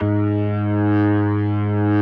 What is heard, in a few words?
B3, First Call Vintage Keyboards